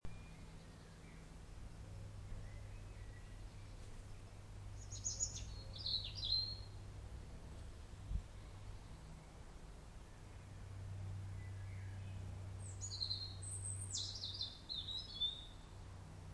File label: garden sounds